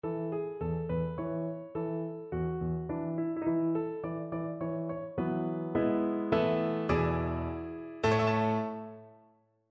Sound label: a major, Elaborate Cadences; dressed up perfect cadence; For this project I was asked to write four examples of a dressed up perfect cadence.